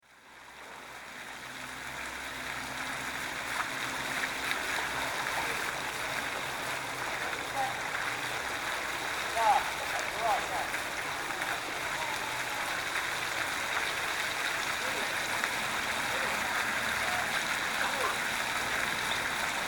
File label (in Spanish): fuente de agua; mendoza; paseo sarmiento